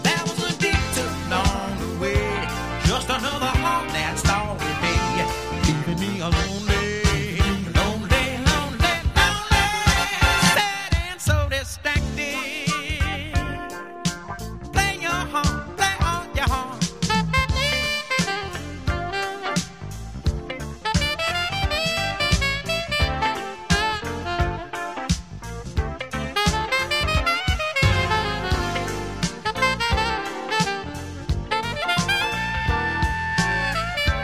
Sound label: Distracted Solo